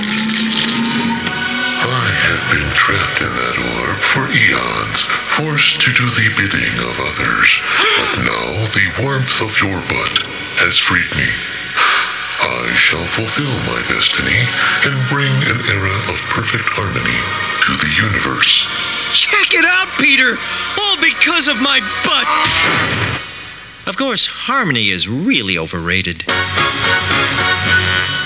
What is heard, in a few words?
Orb creature